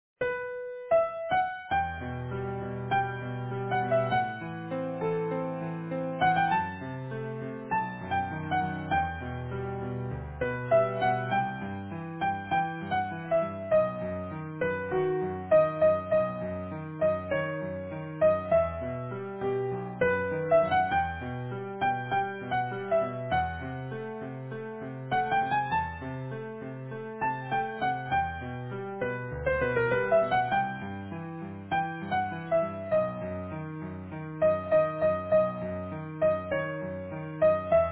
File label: Easy Piano